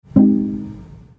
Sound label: Alert